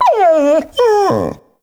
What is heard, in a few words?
Kubrow Tame Loot Fail F; Warframe